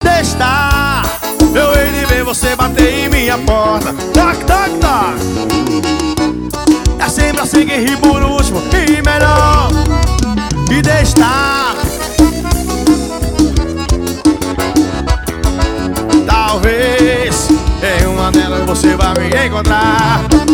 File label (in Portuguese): forro promocional; hallstudiomovel